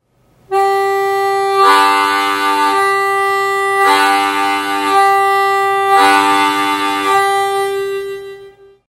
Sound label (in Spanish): asp y acorde